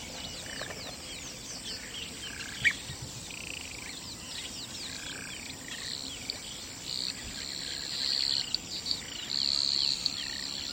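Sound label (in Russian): щебетание заморских неведанных птиц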